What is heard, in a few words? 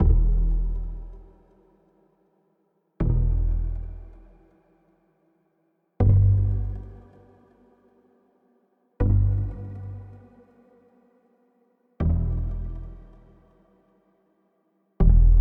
bass